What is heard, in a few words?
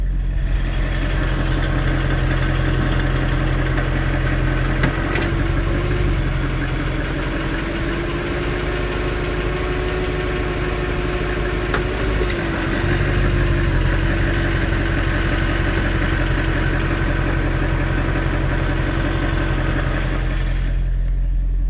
rattle after warm up "d" shifting when car stopped noise under car